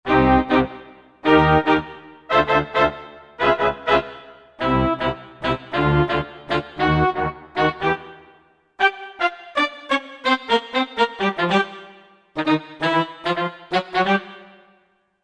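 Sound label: Small Brass Group, Roland JV-2080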